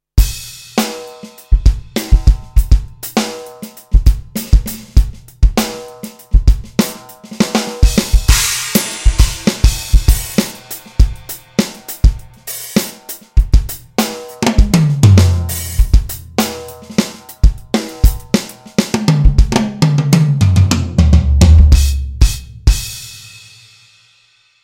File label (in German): Schlagzeugsolo